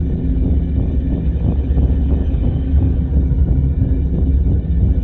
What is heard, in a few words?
perdition beam windup; apocalypse